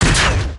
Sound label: cannon blast; destructibles